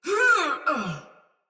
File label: Death Soldier Mace Agent Female; Battle; Sinecure Melee